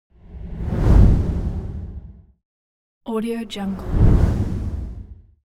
cinematic transition push

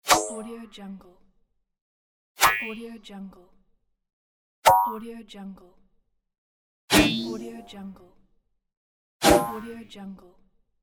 robotic swish; transitions and movement

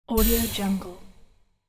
drilled alert; urban sounds

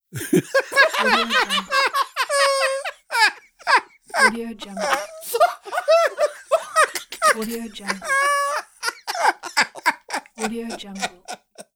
two men laughing hilariously

